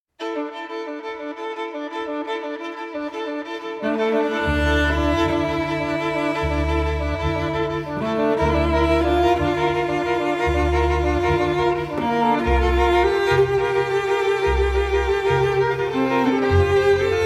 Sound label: violin concerto excerpt; solo violin, string quintet or string orchestra; VIOLIN CONCERTO No. 2